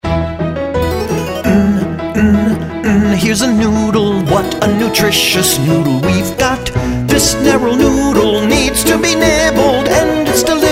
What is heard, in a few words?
N Song Noodle; kindergarten grade 1 2 letter sound